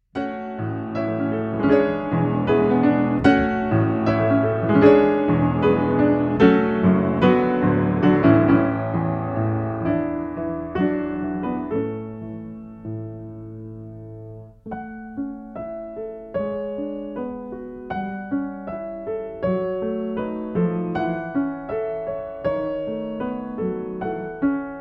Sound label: Grand piano; PETROF